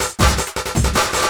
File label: LOOP SD, Drum & Bass, 160 BPM; Ueberschall; Drum & Bass Resonance